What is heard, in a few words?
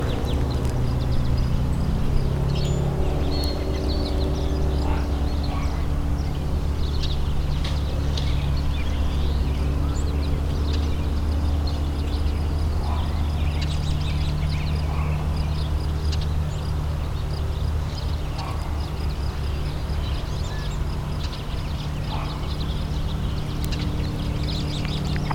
Vernon Outdoors, binaural